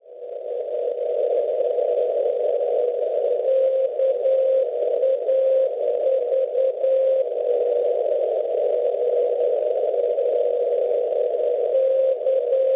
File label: NDB Kavran Croazia 265 kHz; Schwarzsee